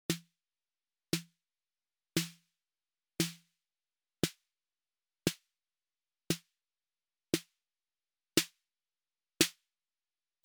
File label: 808 snare variations